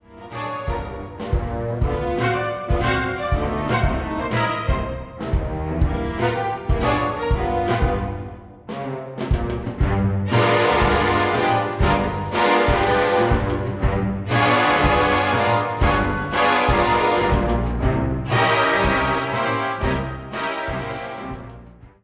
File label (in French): orchestre symphonique